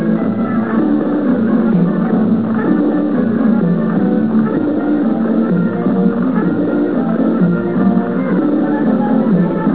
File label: conga